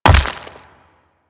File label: Rock Elemental Footstep Default